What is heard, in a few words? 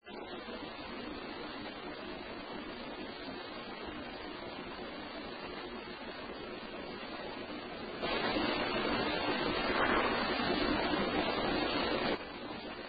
Unexplained Breath; Mahood Hall Bluefield State College; 1st Floor